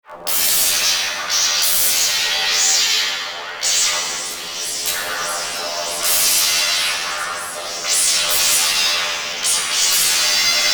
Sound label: Electric Shock